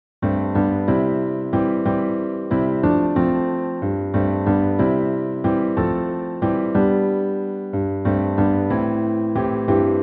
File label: piano